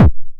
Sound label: 909 BD Tape Sat